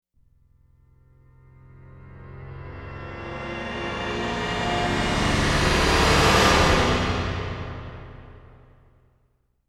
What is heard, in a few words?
High Tech Vehicle Pass By; podcasting